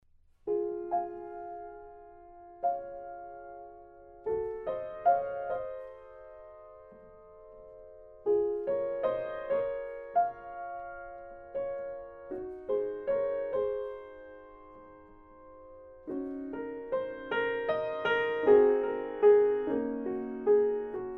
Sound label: Piano C212, mic shootout